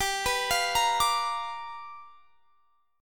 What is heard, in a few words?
G 7th Sharp 9th G chord